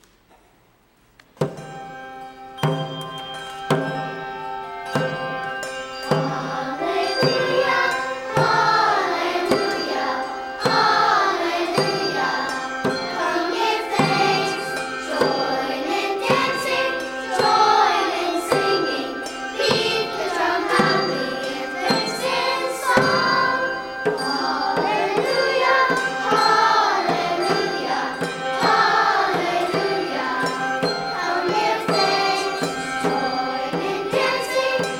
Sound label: Anthem, Carillons